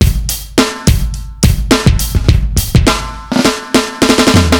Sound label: Funky 105 fill, Drums Beats